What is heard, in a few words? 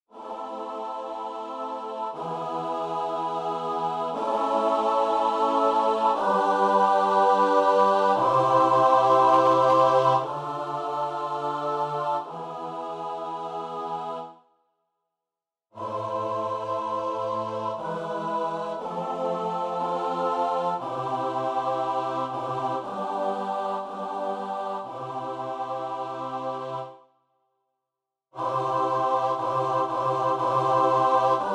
midi; rosselli; adoramus te